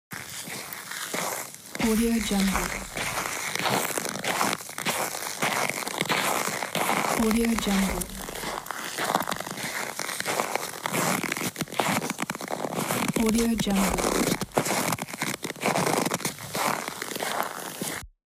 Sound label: snow walk